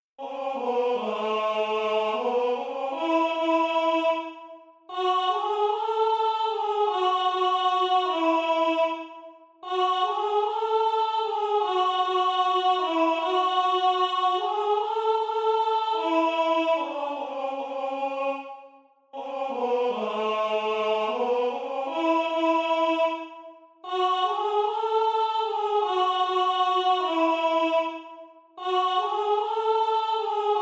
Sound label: MIDI SSAATB Lead